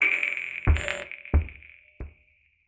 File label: Tone, IDM; Sonic Foundry (Sony Creative Software); Twine-IDM